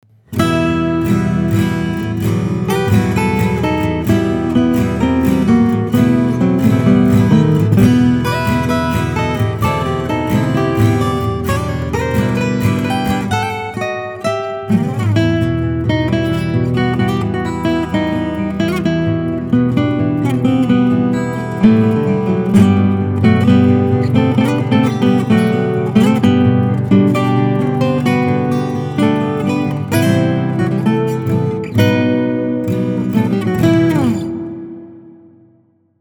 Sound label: Acoustic; The Ultimate Show